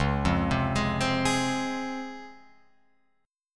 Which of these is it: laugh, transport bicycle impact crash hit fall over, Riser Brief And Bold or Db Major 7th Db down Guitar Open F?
Db Major 7th Db down Guitar Open F